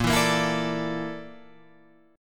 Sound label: A sharp Major 7th Flat 5th A sharp